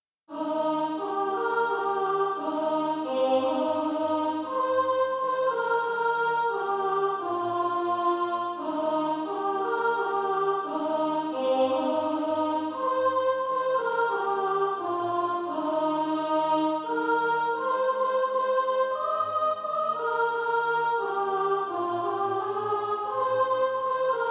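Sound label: soprano; SATB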